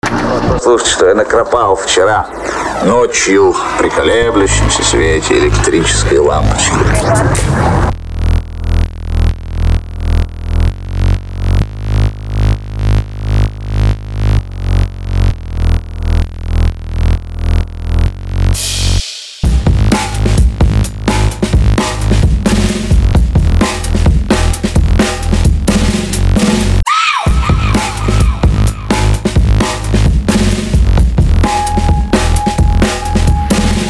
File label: Drum